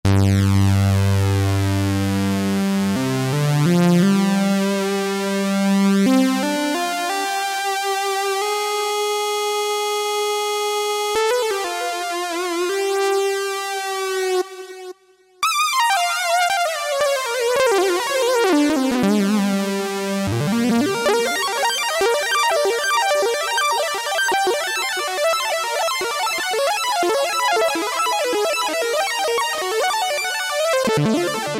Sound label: Mg Lead (Ribbon), Korg TR-Rack